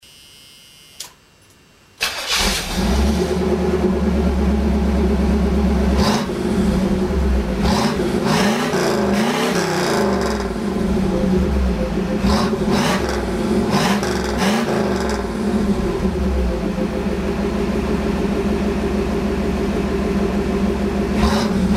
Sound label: Stock, exhaust